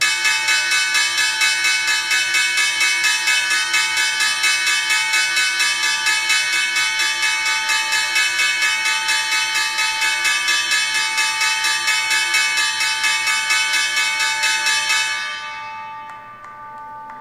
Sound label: new york stock exchange opening bell